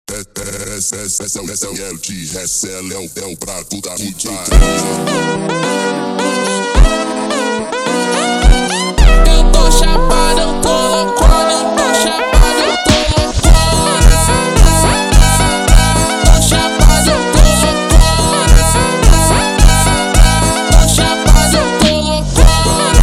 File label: funk super slowed